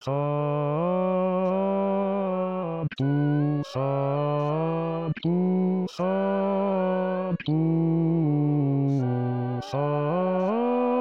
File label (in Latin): Sanctus Bassi